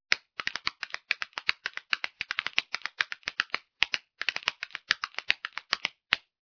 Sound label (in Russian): stuk derevjannyh lozhek